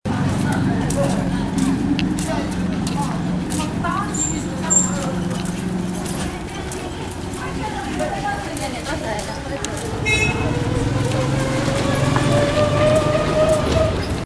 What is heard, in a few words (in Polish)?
ulica; Chiny